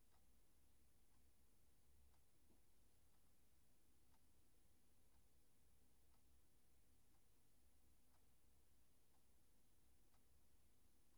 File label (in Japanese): ラップ音・耳吹きASMR